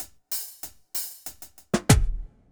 bumping 95

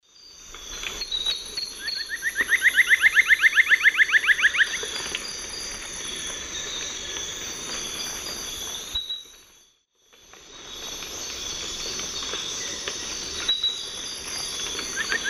Dendrocolaptes picumnus, songs 2 birds; S. America; Eastern Lowlands

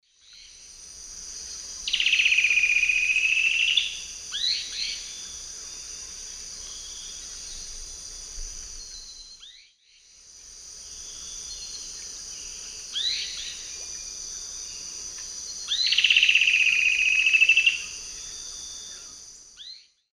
Xiphorhynchus obsoletus, song; S. America; Eastern Lowlands

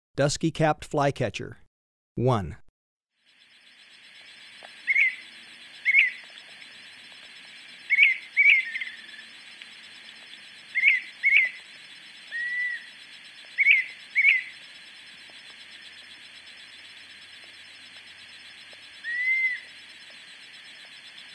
Dusky-capped Flycatcher; S. America

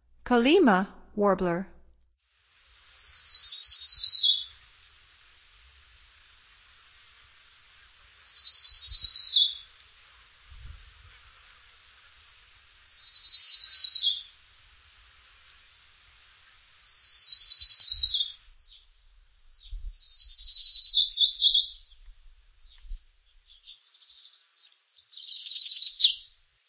Colima Warbler, Songs of Birds of North America; Stokes

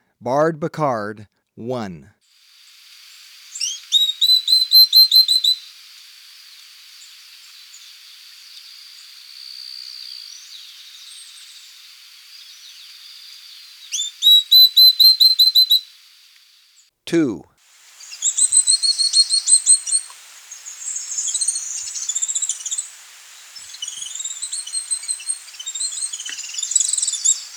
Barred Becard, Birds of Ecuador; S. America

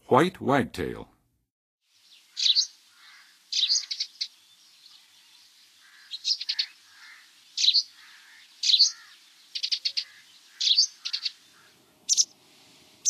White Wagtail, Songs of Birds; North America; Western USA; Western USA Songbirds

